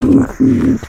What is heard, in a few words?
Skullken Idle; creatures organic